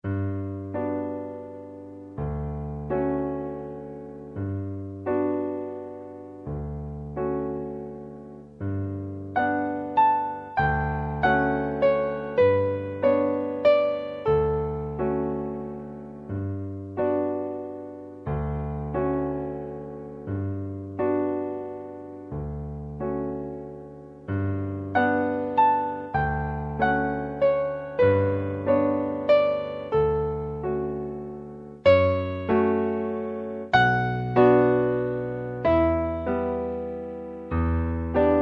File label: gymnopedie, piano